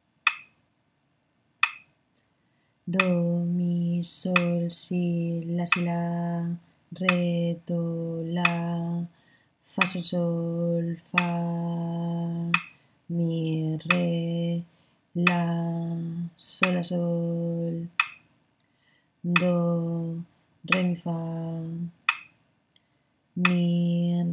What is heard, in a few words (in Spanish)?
ritmo 6-4; Mordente